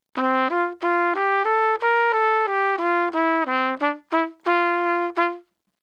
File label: Trumpet; instruments-of-the-orchestra